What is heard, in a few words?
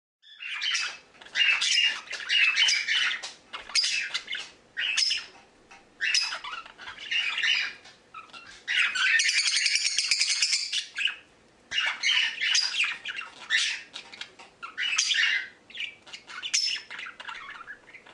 Agapornis lilianae